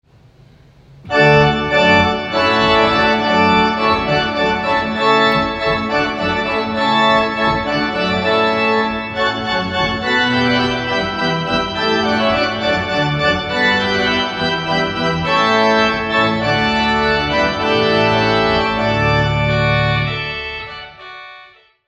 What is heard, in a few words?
Hornpipe; wedding music